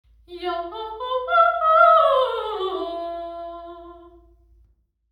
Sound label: mine sing; Gill Heads mine; site 2; 2 way mono processed